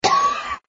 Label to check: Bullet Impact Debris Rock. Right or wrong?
Wrong